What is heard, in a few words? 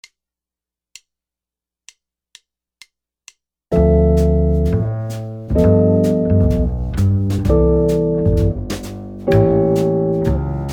jazz guitar comping